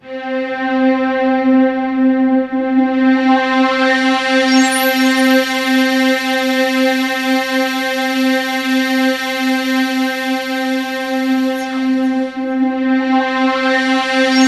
C4, Synth Line; 90 s Sample CDs; NiceBeats; SynthLine VOL-15

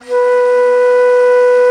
T. BONSURI, India Winds; E-MU Producer Series Vol. 7 – Old World Instruments

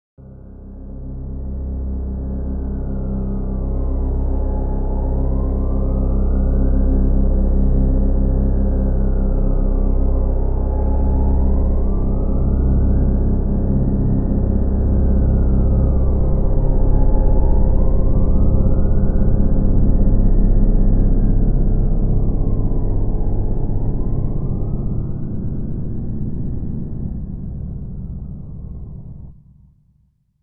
Bass, Sirene; Bizarre Planet

